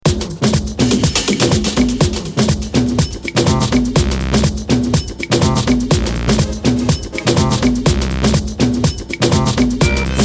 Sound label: Electro Indian